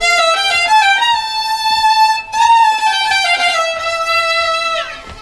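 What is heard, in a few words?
Balkanish Traditions strings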